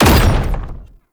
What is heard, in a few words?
Perfect Heavy Attack Impact, Weapons Melee